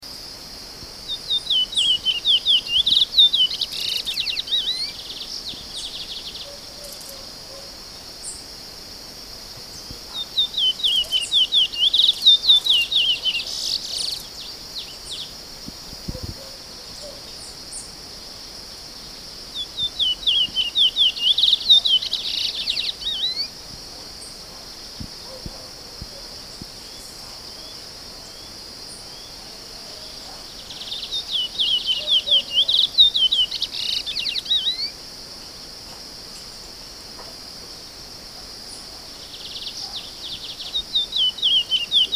Canto do Curio

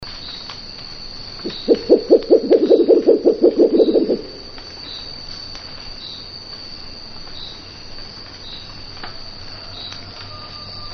Som murucututu

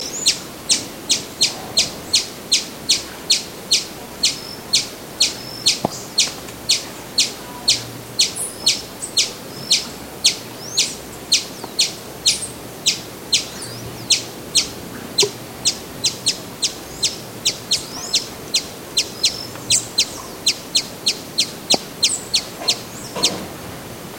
Som Beija flor cinza

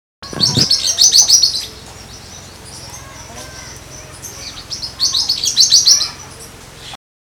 Canto de Periquito

